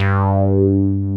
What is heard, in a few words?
DIGALO G3, DIGALOGBS